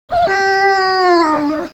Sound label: horde wolf ambient; mobs